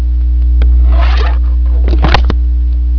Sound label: close